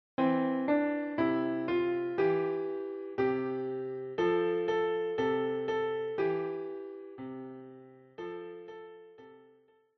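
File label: piano; Alle meine Entchen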